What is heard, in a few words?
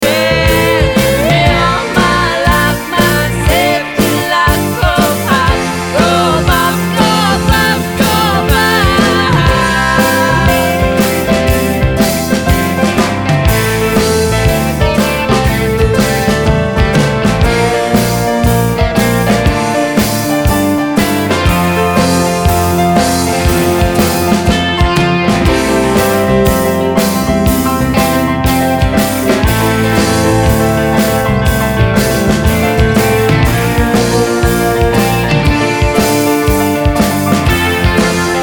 Alien Clip Gtr; api 525